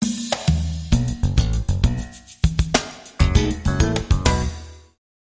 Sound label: Jazz